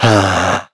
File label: Human Sigh, Vox; en; voices; heroes; Shakmeh; kings raid